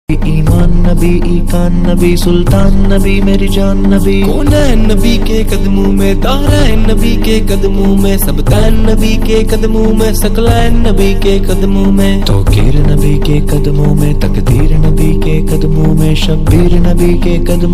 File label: islamic ringtone